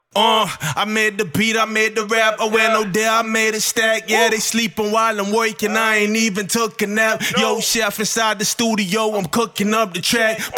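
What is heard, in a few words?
hip hop 150 A minor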